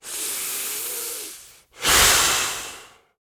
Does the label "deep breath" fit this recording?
Yes